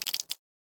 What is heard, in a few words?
blood drips